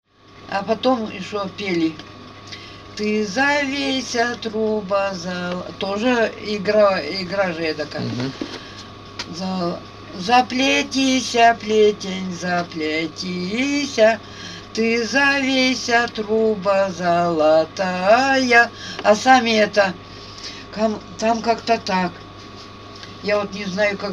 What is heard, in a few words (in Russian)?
Заплетися, плетень, заплетися, Чарышское